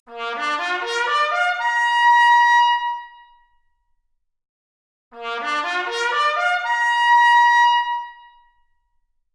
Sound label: Trumpet arp, Stereo output noised; 4bit